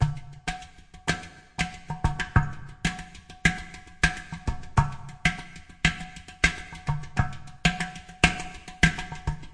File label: udu groove, drum loops and breaks, 100 bpm; 091 to 100 bpm